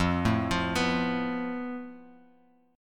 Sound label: F Major Flat 5th, Guitar, Open F; FMb5 arpeggiated; JGuitar